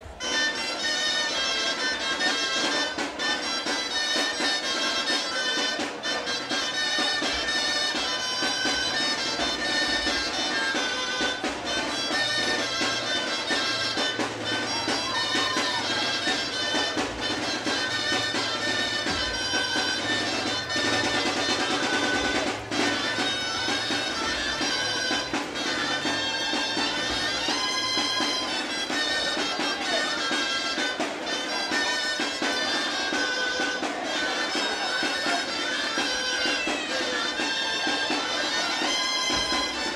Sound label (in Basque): Fandangoa, Gaiteroak; Uharte Arakil